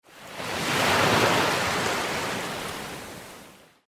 volna

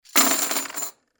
vysypali neskolko monet krupnyh na stol

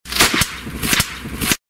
vystrely malenkih; Звук петард

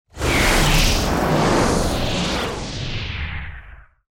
vjuzhnyj dlinnyj; Звук ветра; Звук ветра для перехода в видео или для монтажного перехода в аудио монтаже

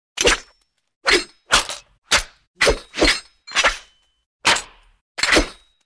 zvuki razrubanija so svistami; Звук мечей; Звуки сражений на мечах, звук ударов меча

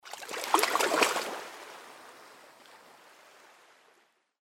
plesk s bryzkami

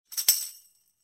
udar so vstrjahivaniem

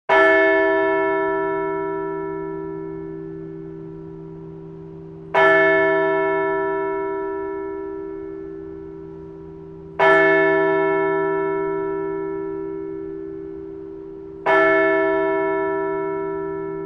udary bolshogo kolokola

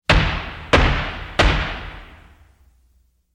3 groznyh udara; Звук молотка судьи в зале суда; Есть звуки суда

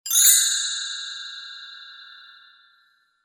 vzmah volshebnicy

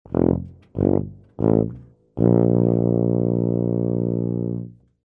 nelepyj itog grandionogo nachala

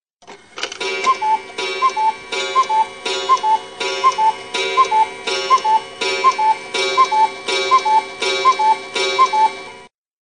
Chasy kukushka s boem

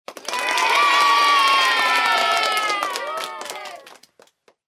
eee i apl

